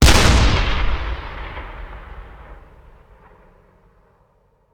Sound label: Cannon Tank; Sound Ideas; Guns